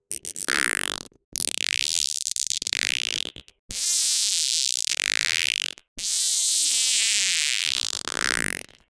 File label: Stretch Elastic Sound, cartoon